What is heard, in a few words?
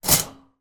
foley shopping baskets metal x2 bang together